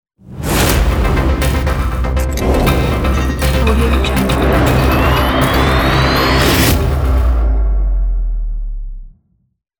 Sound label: logo action